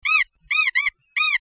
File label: messagetone; Nokia 6270